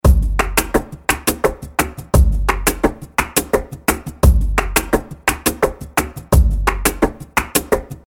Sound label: Verdiales 172bpm, flamencoloops